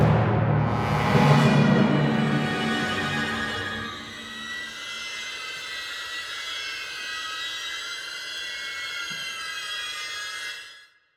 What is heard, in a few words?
Boss Fight Ultimate; children of morta; Temple Music